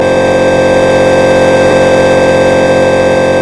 buzz

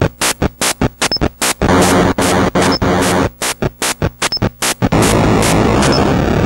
chunky

